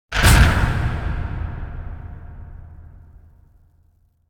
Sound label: Airy Whoosh Impact Medium; Hollywood Edge; sound designer's toolkit v2